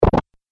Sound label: Arcade Game Drop Sound; Video Game Sound Effects; Retro Inspired; Nintendo, Atari, C64